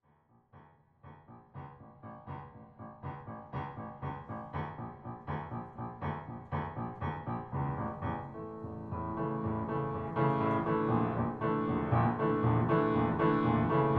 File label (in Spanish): ritmos andaluces zorongo